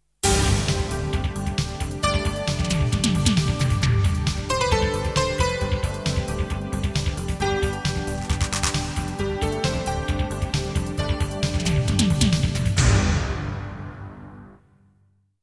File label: jingles; 90 DDD Jingles